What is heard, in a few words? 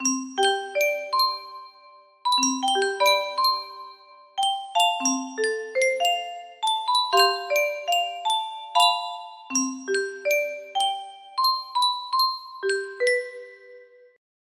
piano, music box